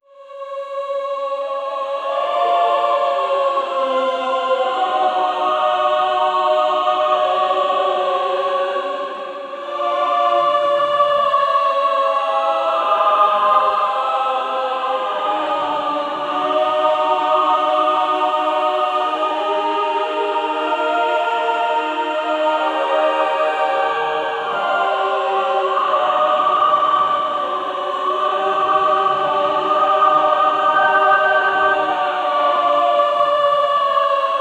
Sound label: a choir of angels scary; angelic